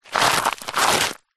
foley shoe scrape fast gravel stones on road or path